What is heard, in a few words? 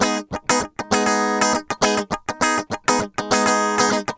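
Guitar, G#; Best Service Gigapack 2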